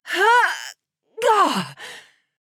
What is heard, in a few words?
Grunt Jump; Paladins; Inara